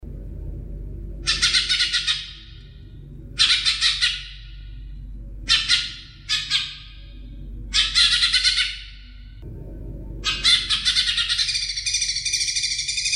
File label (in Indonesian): Suara Cucak Ijo Ngerol Panjang